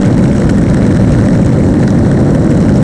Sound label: roll asphalt, terrains; Tony Hawk's Project 8; XBOX